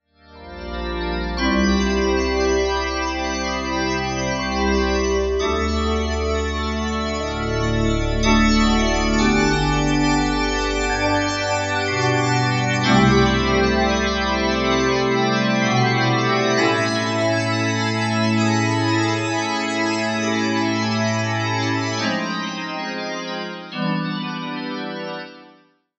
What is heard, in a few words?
Korg M1 Magic Organ; syntezatory